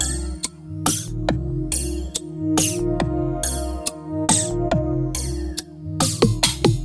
Dark Ride, Synchro Funk, Beats

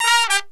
TRUMPETS; Greytsounds VOL-1

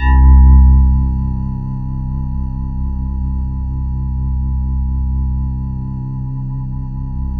C3, Synth Line

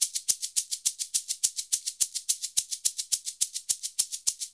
SHK, Shaker; Univers Sons; Basicussions

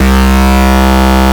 BUZZ SQUARE, Synthy Tonal; Distored Reality

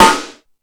Ambient Rock, drum machine; E-MU Pro-Cussion-1

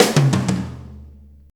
90 BREAK, DRUMS

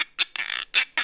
Roots Reggae; Sounds Good - Roots Reggae

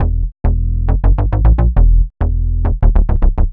Stat Bass E, uk garage, 136bpm, Bass; Lines n Loops

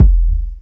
BD, B.DRUM, House Side Of 2 Step; USB Soundscan vol.51; AKAI

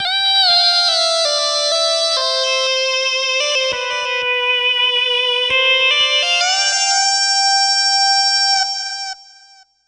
80s Lead; Riffs & Solos; Dr. Fink's Funk Factory

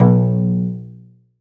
SOLO CELLO, Synclavier Strings; ILIO

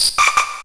MORSE160, Hard & Loud Techno

